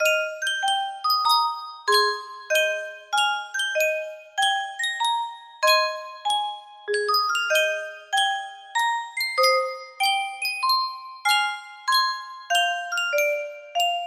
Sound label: Yunsheng music box